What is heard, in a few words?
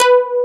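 Dance Bass; awe32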